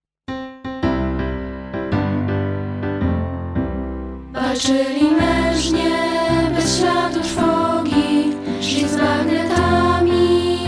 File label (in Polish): HYMN SZKOLY